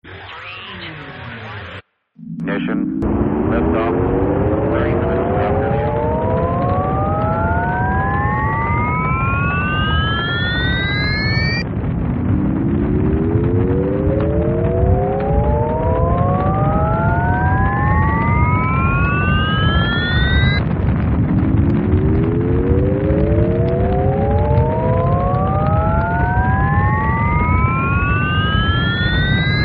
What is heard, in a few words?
Count Down; iPhone3000